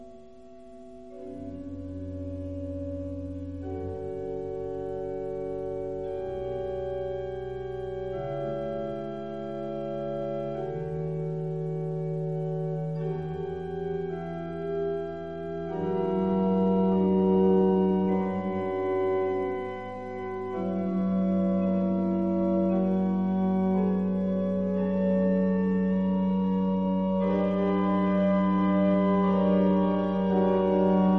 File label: Toccata, orgue